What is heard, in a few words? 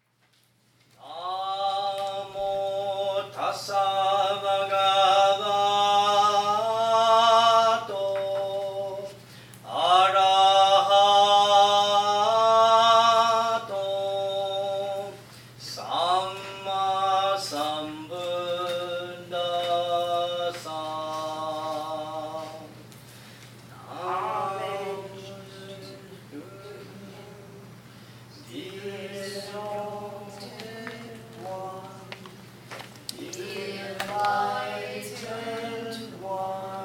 Bilingual Chanting; Seabrook Temple